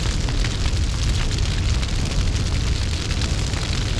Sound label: very big fire; The Sum of All Fears